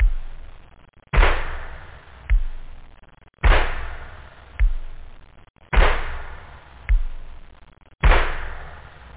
pop beat, loop; da capo vol1; instruments

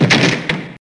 Door dam

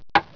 slam down; 10,000 Graphics Pack Volume 1; Media Graphics International

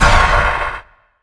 plasma; Earth and Beyond; EA Games

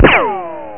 RICOCHET; Duke Nukem 3D Kill-A-Ton Collection

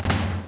lever

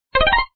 sound Snow; swiffer

